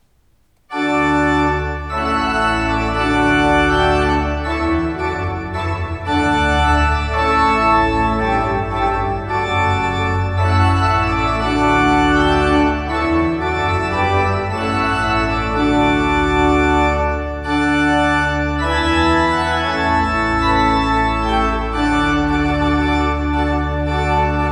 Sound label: Trumpet Tune